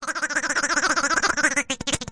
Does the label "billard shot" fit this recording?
No